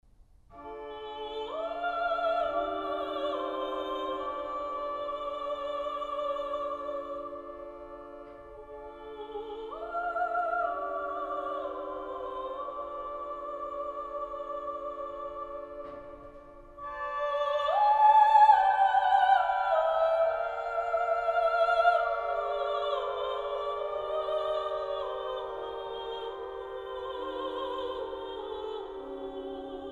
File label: Vocalise; Jehan Alain